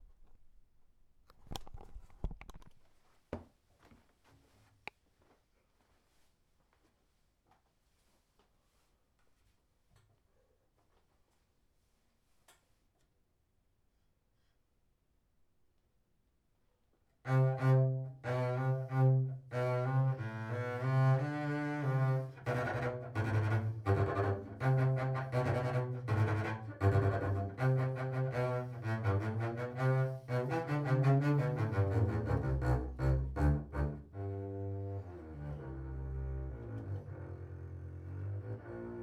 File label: Allegro con Brio; Symphony no.1 in C